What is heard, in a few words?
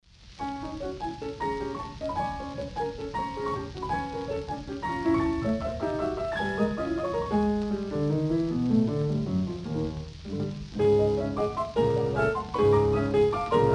Violin and piano